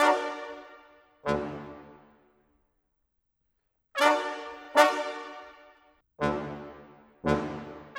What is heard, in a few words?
Brass; Sonic Foundry (Sony Creative Software); Orchestral VOL-2; Modern 24